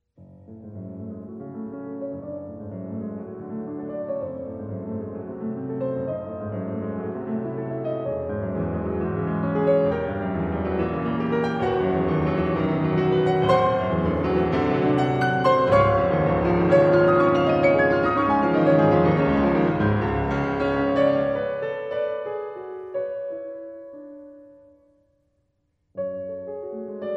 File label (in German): Klavierstucke, Capriccio in F sharp minor; Klavierstucke Op.76 - Capriccio in F sharp minor , Un poco agitato